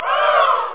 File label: OOO; drwho